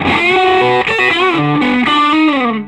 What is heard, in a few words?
SOLO, Vintage Blues Guitar